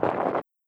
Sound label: foot limp; midnight guns; bootsector male 00